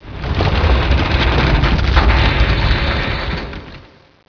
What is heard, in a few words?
quake; dm borehole